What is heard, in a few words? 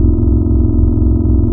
PPG CHOIR, wavetables